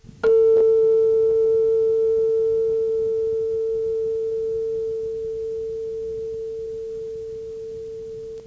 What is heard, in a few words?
tuning fork A4